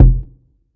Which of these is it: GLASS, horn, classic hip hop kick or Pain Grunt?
classic hip hop kick